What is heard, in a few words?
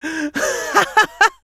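laugh happy, French; Rire Joyeux 4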